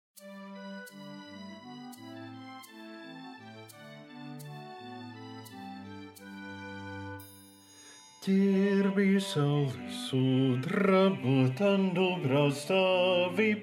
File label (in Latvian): gerbies bass